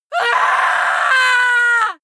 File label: female scream horror; schreie; menschen